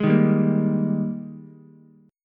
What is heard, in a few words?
Ab Major 7th Suspended 2nd Ab Ukulele; AbM7sus2; strummed; chordshape; 1,3,3,1; JGuitar